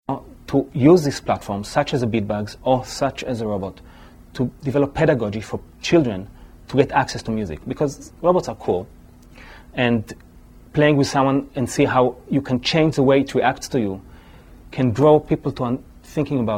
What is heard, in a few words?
percussion robot